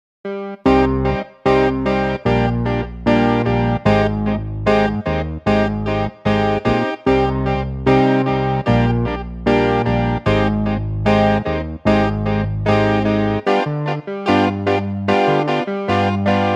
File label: Piano